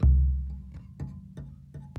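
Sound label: Calm Far, music; from dust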